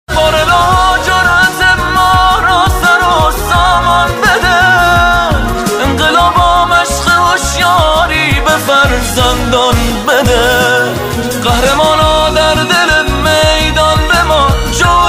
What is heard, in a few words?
ringtone